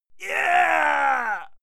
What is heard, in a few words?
male yell strained